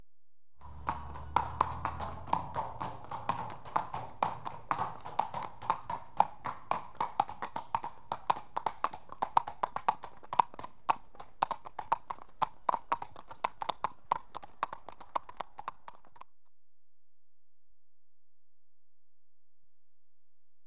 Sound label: Two horses walk under bridge on t; BBC Sound Effects Library